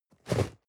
cardboard box medium shake single hard short; objekte

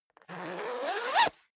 zipper down jacket open accelerate; objekte; reissverschluss

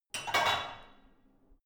barbell only replace hard

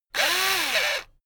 electric drill screw in medium; maschinen; bohrer; soundpool

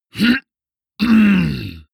male harrumph angry; menschen; koerpergeraeusche; soundpool

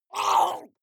water monster female gargle pain short; comedy-cartoon; soundpool

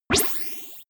bubble buzz shot; laser

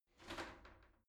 shower slide door slide open soft

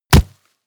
body fall grass hard; krieg-waffen; nahkampf; soundpool

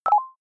click boxy tonal; signale

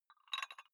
dumbbell take off very small weight gentle

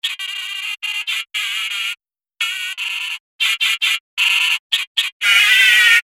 creature; kreaturen; comedy-cartoon; soundpool

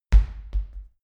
medicine ball bounce, fitnessstudio

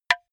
wood board clap hard; objekte; holz

